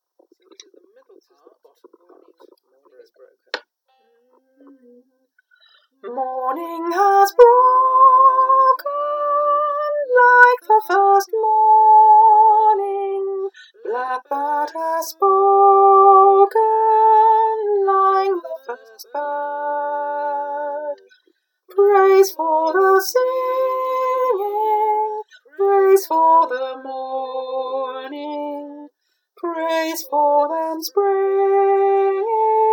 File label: morning is broken tune